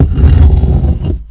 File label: stone; The Elder Scrolls III Morrowind GOTY; XBOX